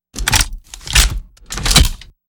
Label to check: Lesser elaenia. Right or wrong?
Wrong